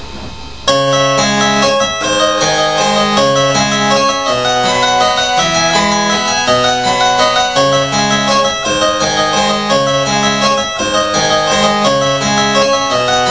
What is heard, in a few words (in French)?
Son Clavecin